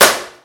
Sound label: swat; Microgame Nothing